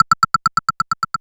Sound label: Synth Perc; Dream Zone